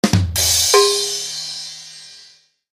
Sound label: Rim Shot With Cowbell